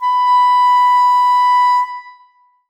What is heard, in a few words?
clarinet b5; Sonatina Symphonic Orchestra